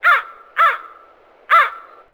crow

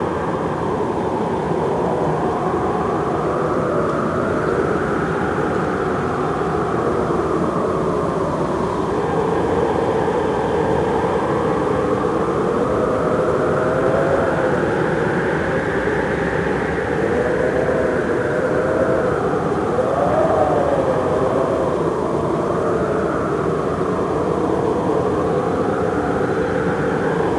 wind

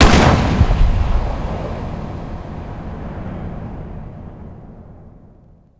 explosive impact; WAR

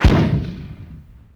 60mm mortar; WAR

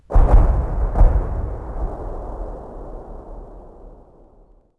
distant gun; WAR

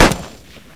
30cal; WAR

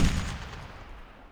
50cal ringout; WAR

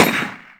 m1 garand; WAR

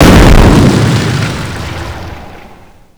small explosion debris; WAR

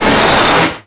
jeep critical hit; WAR

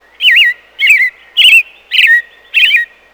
robin

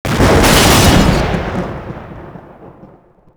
big bang; WAR

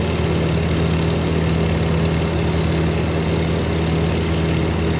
p47 constant; WAR

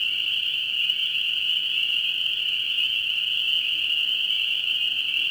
crickets night; WAR

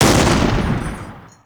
axis anti air; WAR

